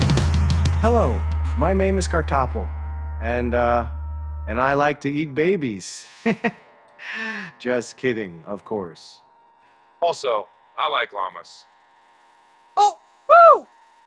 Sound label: bark